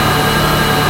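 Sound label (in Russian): скрипучее жужжание мотора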